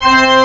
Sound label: sc88pro church organ high; tumbledemerald